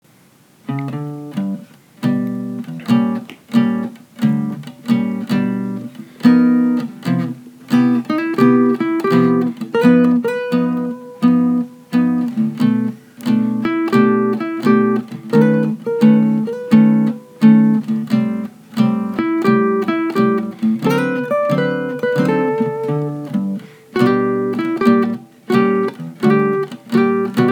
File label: Maple Syrup Acoustic Comp Solo, guitars